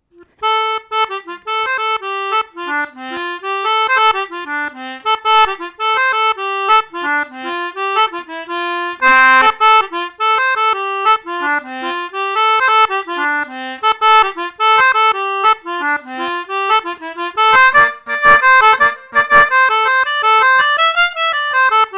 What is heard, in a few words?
jig, concertina